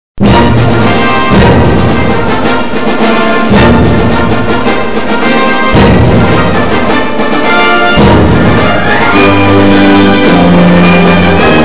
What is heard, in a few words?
fanfare, accordion